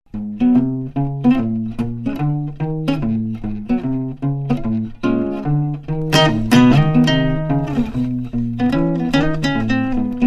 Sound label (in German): jazzgitarre